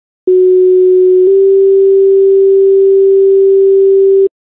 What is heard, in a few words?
coroner, tones